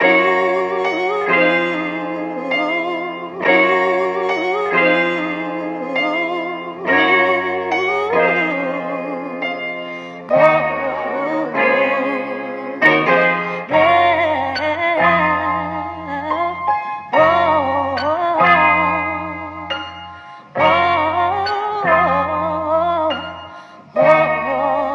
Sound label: voice and piano; Loops; Phonky